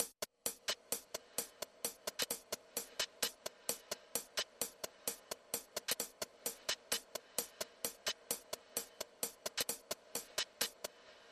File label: Drums